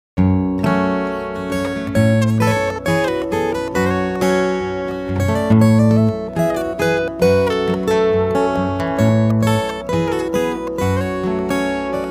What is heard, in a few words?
Sea Loop